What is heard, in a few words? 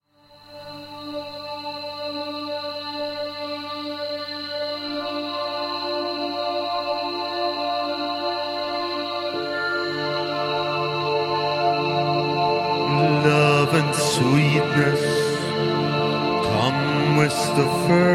Love Sweetness Chant